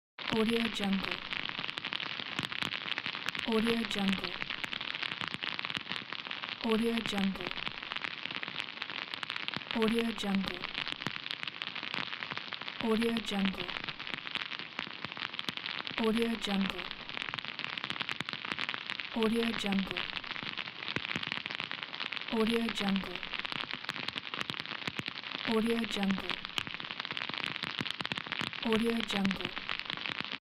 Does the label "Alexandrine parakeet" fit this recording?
No